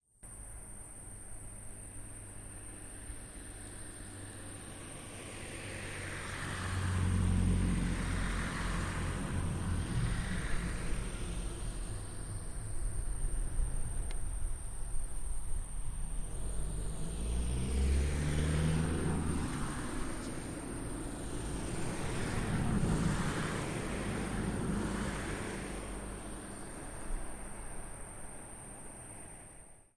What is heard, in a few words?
electricity box; hrstrasse gehlsdorf